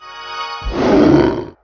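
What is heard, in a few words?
summon; Aleria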